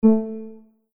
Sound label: piano